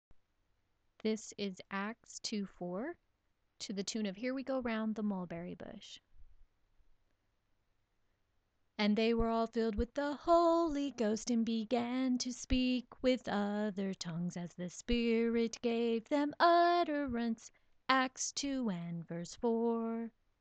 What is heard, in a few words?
song